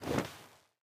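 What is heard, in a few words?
movement armor; smite; pele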